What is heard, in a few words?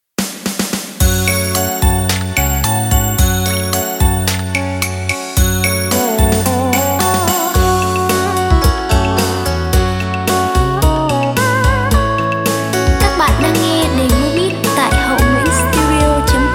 beat, playback